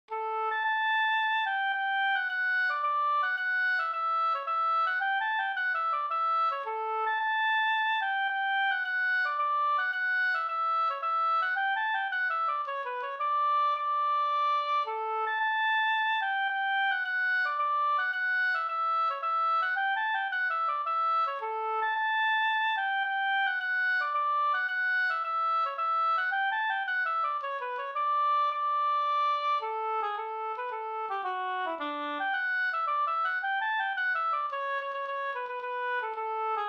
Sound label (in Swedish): polska Brudpolska